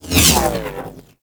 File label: Space Fighter Laser Fire Whiz By; Warframe; RailJack; Corpus; Space Fighter Laser Agent